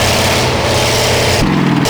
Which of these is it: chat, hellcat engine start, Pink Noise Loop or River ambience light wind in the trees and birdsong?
hellcat engine start